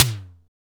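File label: SDS V SNR, snare; Best Service; Real Mega Drums VOL-1; MONO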